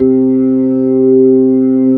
B3, Drawbars; E-MU Producer Series Vol. 2; More Studio Essentials

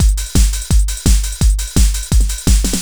LOOP, Eurotech; Sounds Good

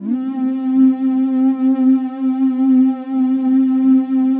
digi pad, Pads

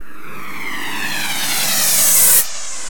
SFX, Drum & Bass 170 BPM; Ueberschall; Drum & Bass Flash Trackz

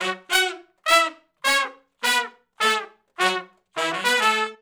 R&B Riff (F#) unison, Horncraft

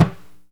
deff mute; Deff Bendir &Req

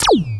JV KIT; Pro-Rec - Synthsonic; JV ANALOG1

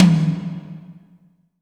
TOM; JV-1080 DRMS; EdgeSounds; Drum Mashines VOL-1

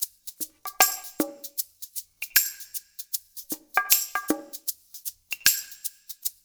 percussion loop; InVision Interactive; Percussion Slam; MACHINE

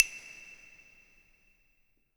clave wet; InVision Interactive; Percussion Slam

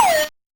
Noise, 8-bit, Bitty Noises

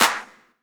CLAP, Dance Drums

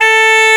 HORN A3, SYNTHESIZER LEAD

